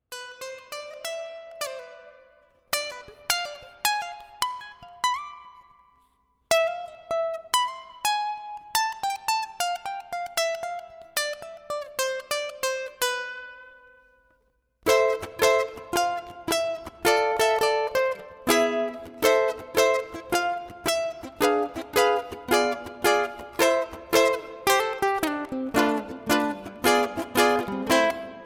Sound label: ukulele